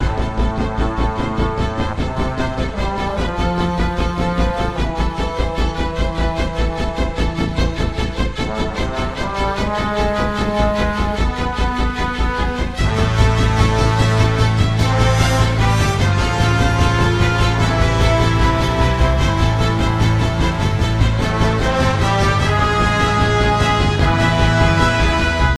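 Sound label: ORCHESTRA, soundtrack; transformers war for cybertron gamerip; CYBERTRONSFATE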